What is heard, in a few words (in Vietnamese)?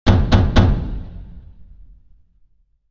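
Tiếng đập cửa mạnh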